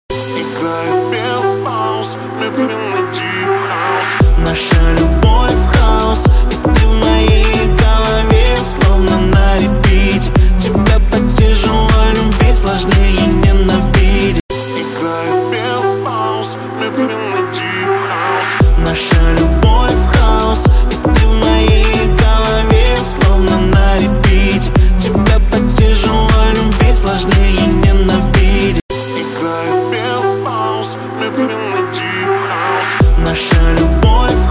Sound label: deep house